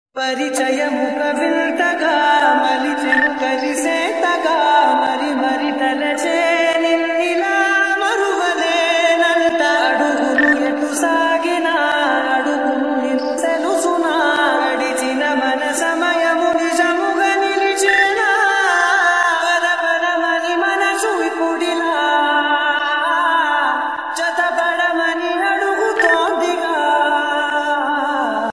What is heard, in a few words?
Telugu Ringtone